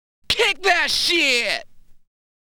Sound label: fx and scratches